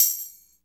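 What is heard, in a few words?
concert, orchestral kit; Roland LCDP03 Orchestral Perc; Orch Combo 1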